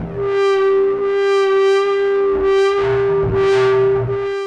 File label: Electric Bass; Basses